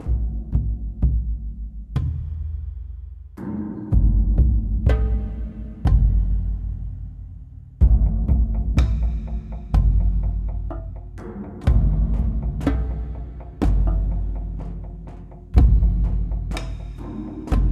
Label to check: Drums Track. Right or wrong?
Right